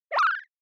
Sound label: Motion Slide Fast